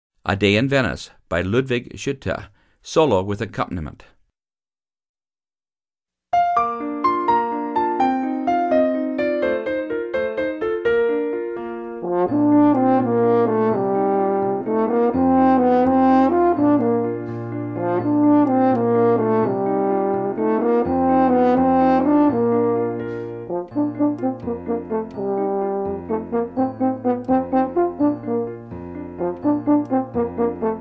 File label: Horn Solo and Accomp; French Horn Solo with Piano Accompaniment – Performance Tempo